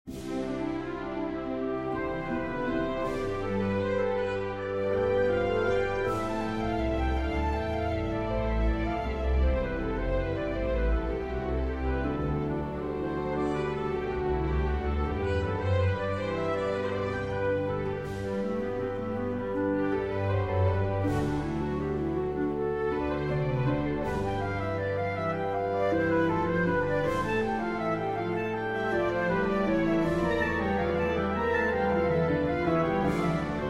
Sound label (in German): Invention in C-Dur